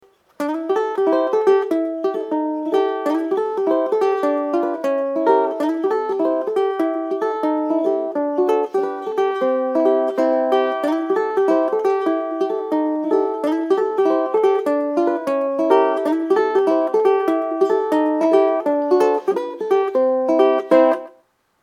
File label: fingerpicking, ukulele